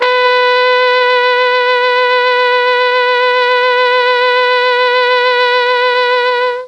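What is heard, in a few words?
B3 trombone trumpet, mellotron; TROMB+TRMPT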